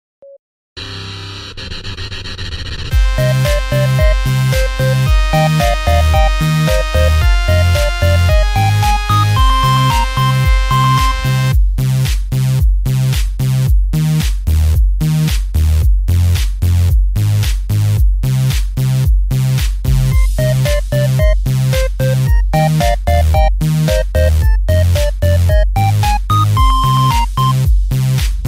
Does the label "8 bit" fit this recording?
Yes